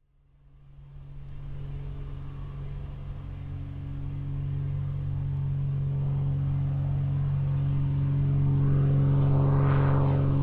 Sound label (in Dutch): prop vliegt overvliegen